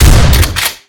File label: Trench Gun; Angry Goy II; SoundEffects